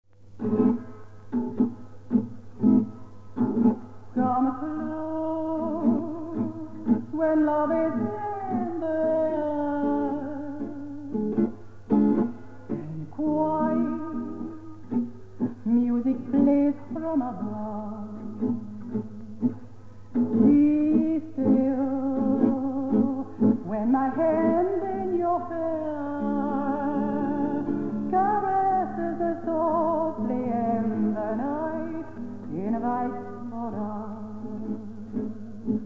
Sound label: Tango mango